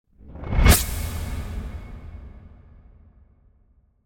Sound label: Metal Reversed Impact Sharp Metal - Startling; Hollywood Edge; sound designer's toolkit v2